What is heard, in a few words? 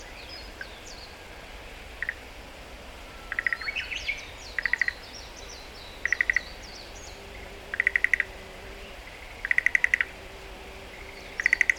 chorus